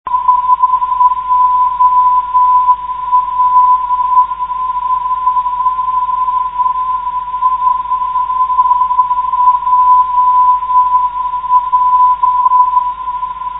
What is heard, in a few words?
434 kHz, ndb